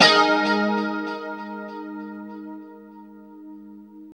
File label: G#4, Guitars; Spectrasonics; Hans Zimmer Guitars VOL-1; JC-SHEEN